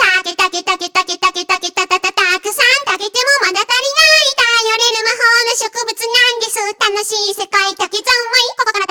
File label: Vo Main